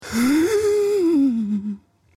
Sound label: death